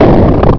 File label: BOULDOOR; Lego Racers